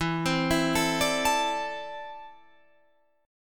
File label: E 7th Suspended 4th Sharp 5th, Guitar, Open F; E7sus4#5; arpeggiated; 16,19,16,16,17,16